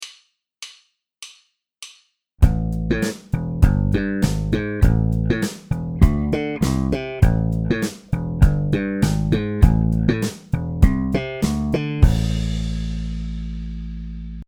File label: thumb pull